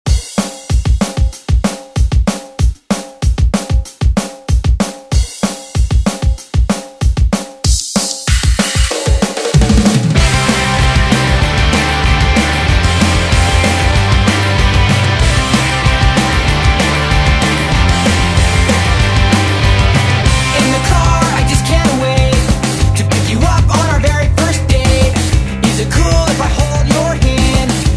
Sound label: Re Drum